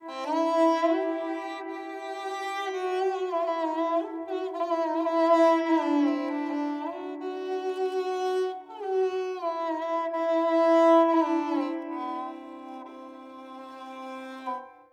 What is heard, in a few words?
String Sarangi Free Time C Sarangi